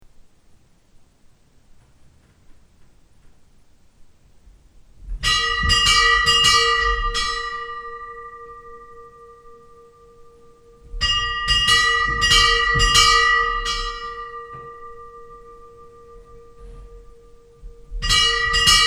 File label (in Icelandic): hjardarholskirkja badar, kirkjuklukkur